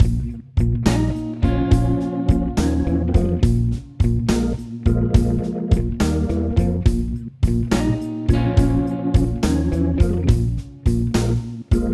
Post Question Loop; YDKJ; The Jackbox Party Pack 5; Jackbox Games

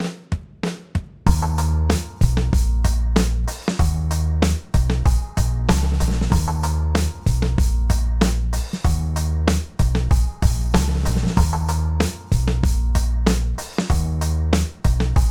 Write Loop; The Jackbox Party Pack 5; Jackbox Games

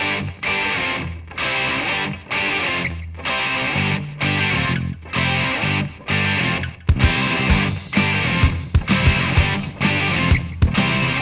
Scoreboard; Bracketeering; Jackbox Games; The Jackbox Party Pack 4

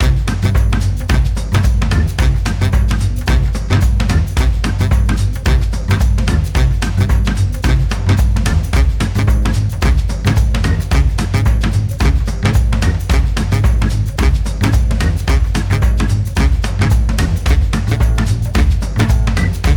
Round 3 Score Reveal; Quiplash 2; Jackbox Games; The Jackbox Party Pack 3

